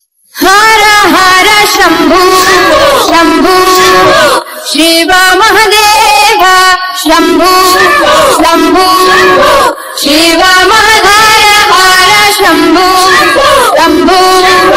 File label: bass boosted; shiv mahadeva